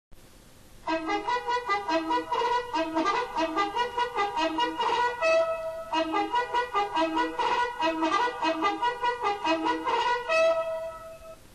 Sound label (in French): Distribution de courrier art, Sonneries reglementaires